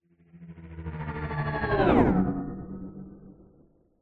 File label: science fiction hovering vehicle pass by fast